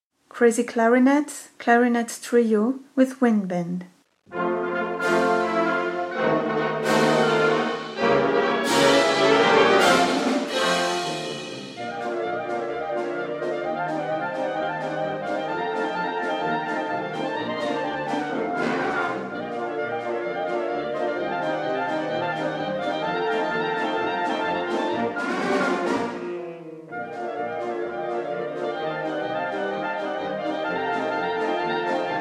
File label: crazy clarinets